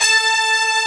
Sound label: BRS A3, BRASS SECTION; AKAI S6000 CD-ROM - Volume 1; BrassWind